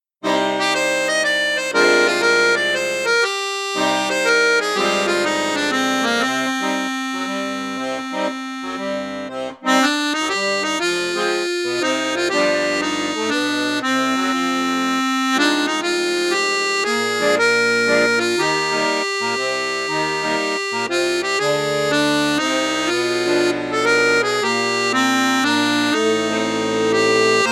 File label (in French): registre flute juste, accordeon; piermaria prodige plus